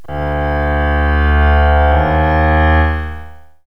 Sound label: bridge, music, drama; KABOOM! - Wild and Wacky Sound Software